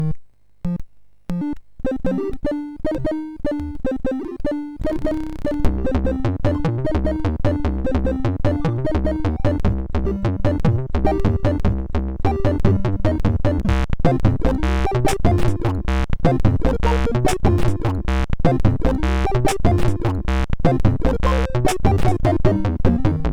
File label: Jazzpjazz sid MOS6581R3